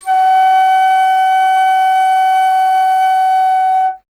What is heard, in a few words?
Alto Flute; Miroslav Vitous - Solo Instruments VOL-2